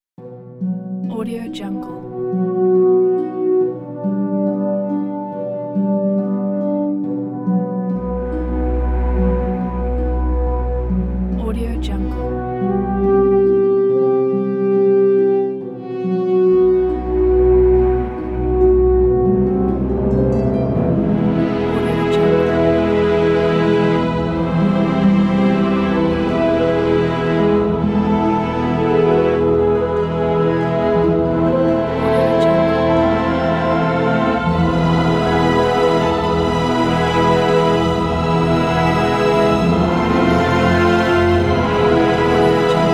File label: majestic fantasty supernatural cinematic